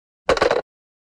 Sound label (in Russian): phone